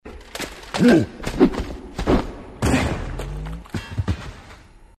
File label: hunter death; dialogue; hunters